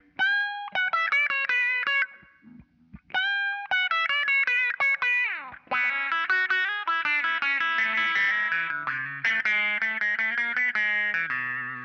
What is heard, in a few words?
Electric Guitar, Loops; Guitars